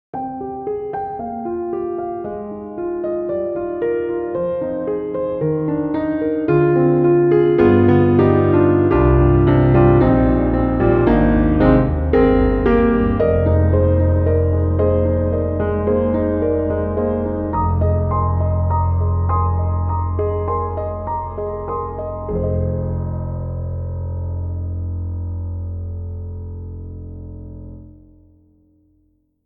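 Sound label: Emotional, piano; Native Instruments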